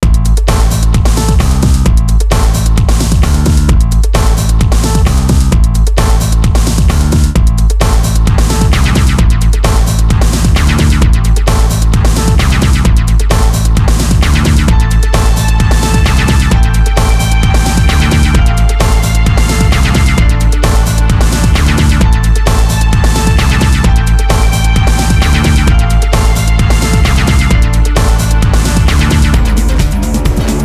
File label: Music GAME HIGH ENERGY